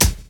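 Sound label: drum chop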